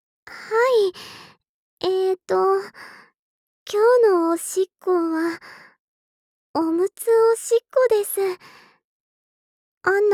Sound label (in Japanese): 今日のおしっこ おむつおしっこ